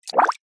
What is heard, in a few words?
cartoon bubbles